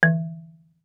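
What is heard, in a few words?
Marimba deadstroke fortissimo E4; MIS Pitches - 2014; Percussion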